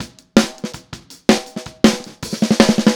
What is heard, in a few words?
Fill Snare, Drums, 162 BPM; Drums from the Big Room The Mixes; Take Your Toms Off